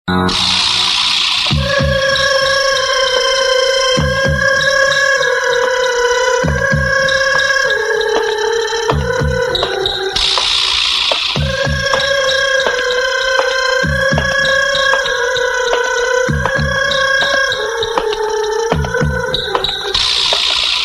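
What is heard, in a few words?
marathi horror tone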